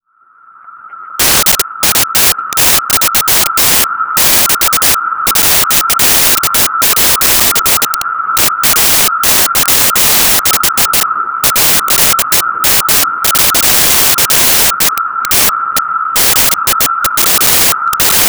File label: cicada